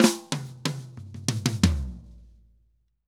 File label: Drum Break 120; Best Service; Festa Latina VOL-1; Drums & Percussion